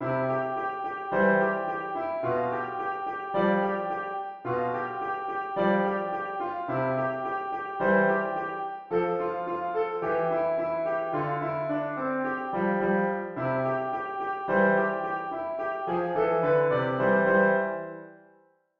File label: whats that thing piano